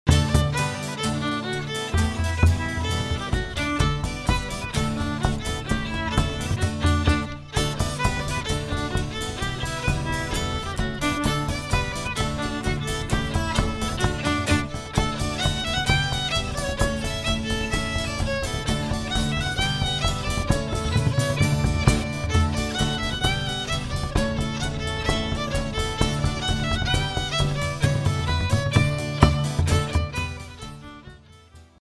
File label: polkas